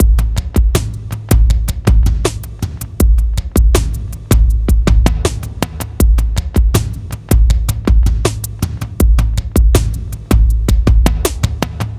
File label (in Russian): эмбиент; Tribes